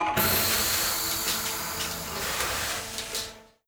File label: event airlock; Stellaris